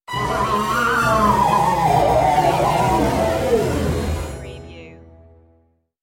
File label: Dark Production Stinger